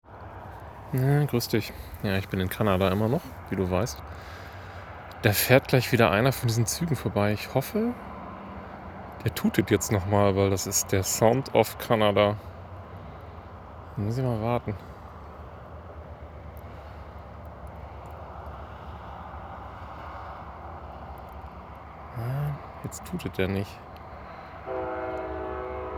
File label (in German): kanada trains, british columbia rocky mountains; kanada roadtrip